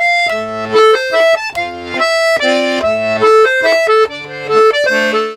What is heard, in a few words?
Accordions; USB Soundscan vol.40; AKAI